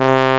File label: trumpet, orchestra, brass